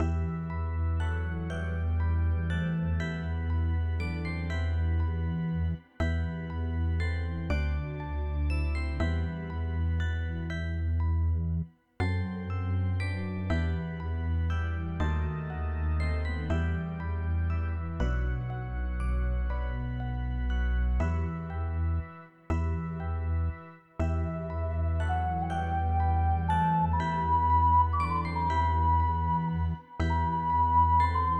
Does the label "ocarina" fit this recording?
Yes